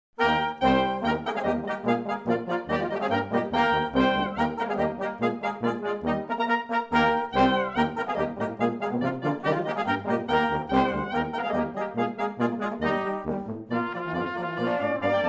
German Band Polka Medley; Seattle

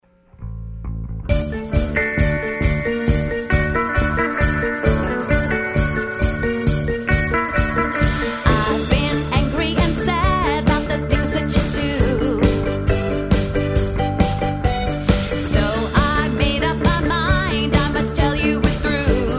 Tribute Band; Seattle

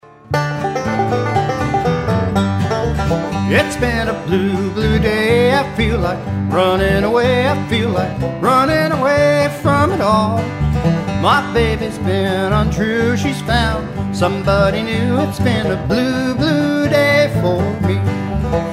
Country Band; Hartford

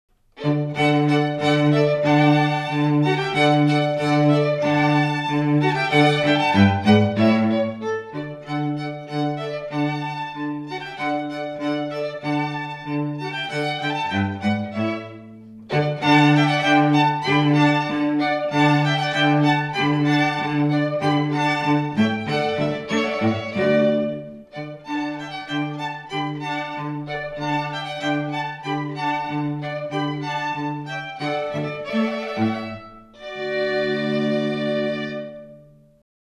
String Quartet, Spring; Seattle

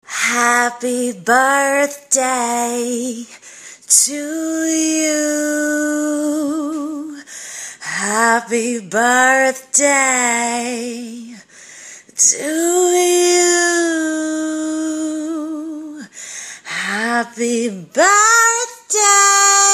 Marilyn Monroe Impersonator, Happy Birthday

